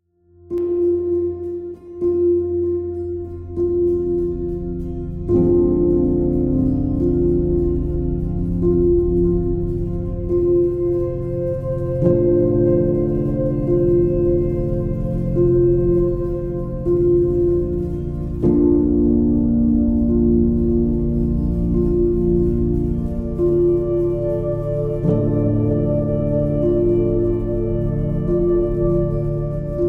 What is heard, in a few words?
Relaxing, meditation frequency binaural beats; Examples of a few meditation projects I have done.